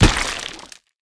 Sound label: pillar hit; smite; god ganesha skin11